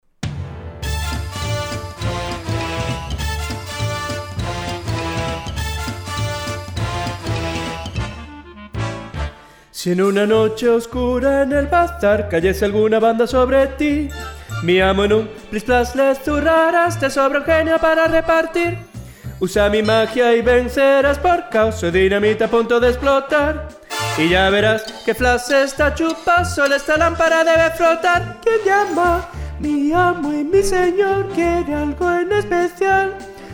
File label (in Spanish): sopranos